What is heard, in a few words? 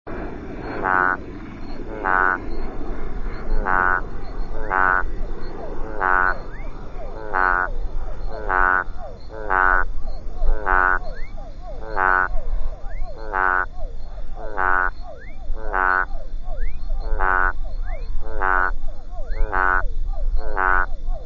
Physalaemus centralis